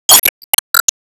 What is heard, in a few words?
Emo Sigh